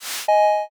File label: transition